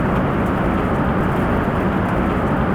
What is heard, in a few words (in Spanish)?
INT RODADA SECO; AOS F1 2022